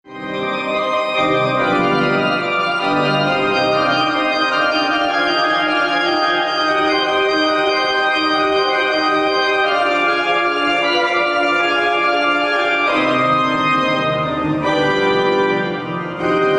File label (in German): Orgel Bach